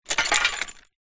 metal chain swing park shake movement; foley

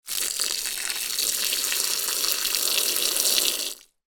foley towel wet water pour on; zapsplat

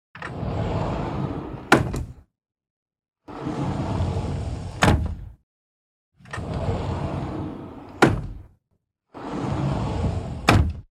household cabinet sliding glass open and close; sound effects one

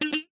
cartoon spring twang ping

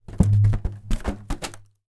foley balloon foil helium movement boinks surface

